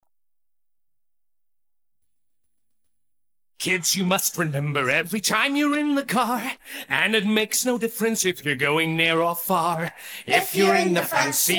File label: Make it Click vocals; Macca's; McDonald's